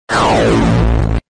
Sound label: developing disappearing appearing